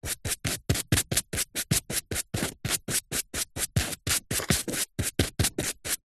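office paint brush artists dabs brushes on wood palette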